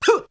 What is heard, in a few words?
Beatbox; ZERO G - Datafile DISC 3